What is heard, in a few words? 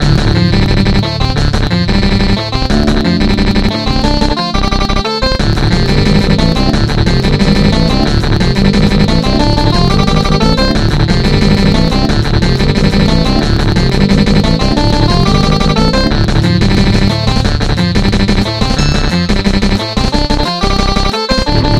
GTR Attack